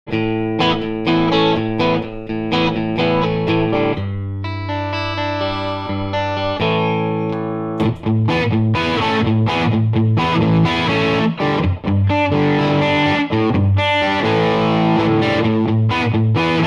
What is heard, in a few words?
Bridge And Combo Clean To Mean